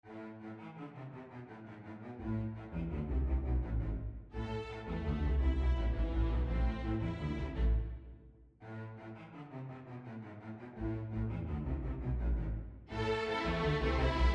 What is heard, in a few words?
Scherzo for the String Orchestra in A Minor